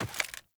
Steps

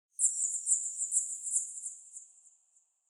Under City Bat Chatter Distant

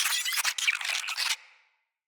Space Drone Enemy Spotted; Enemies; Corpus

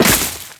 Crewman Step Veg, footsteps; Warframe; Corpus

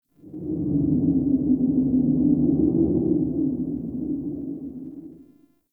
Forest Cave Howl, Ambience; Warframe; Grineer

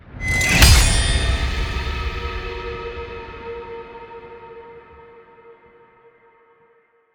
Sword Grab Slam; Chimera Ballas

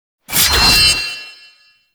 finisher sword; Enemies; Warframe; Duviri; Dax

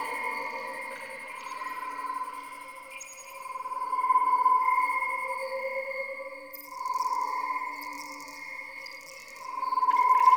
Critter Ambient Stereo Loop; Warframe; Tau; Ambience

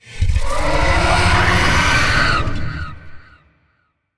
Vocal Idle; Warframe; Zariman; Void Angel

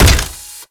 Step; Warframe; Corpus; Arachnoid Wraith; Steps

